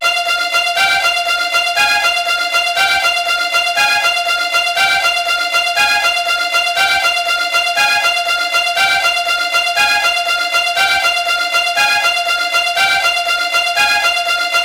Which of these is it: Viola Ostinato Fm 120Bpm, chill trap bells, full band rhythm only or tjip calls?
Viola Ostinato Fm 120Bpm